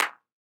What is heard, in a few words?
Clap Avantone CK-7